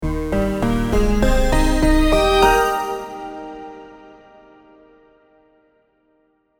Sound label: Audio logo